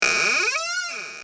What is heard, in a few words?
Creaking Door; ringtones